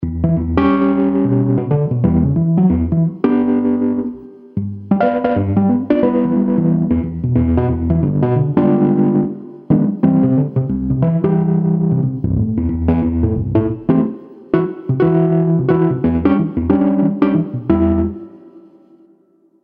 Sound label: Electric 200A Dirty Small Amp; EZKeys